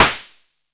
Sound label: ANA WN PERC, ANALOG PERC; Zero-G Ambient 1